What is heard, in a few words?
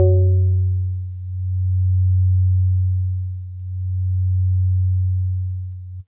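Jazzy Organ DX100 F#5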